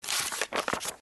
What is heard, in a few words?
empty recycle